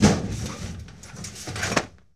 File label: Body Falls on a metal surface